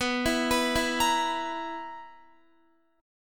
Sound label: B Major 7th Suspended 4th, Guitar chord; arpeggiated; x,14,14,16,16,17